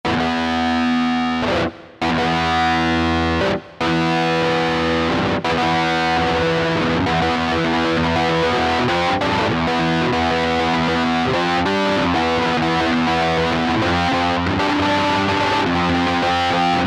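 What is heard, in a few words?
heavy rhythm, guitar; Factory Patches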